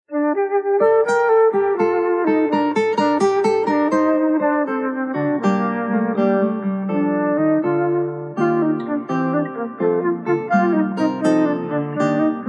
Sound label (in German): gigue, querfloete; charles dieupart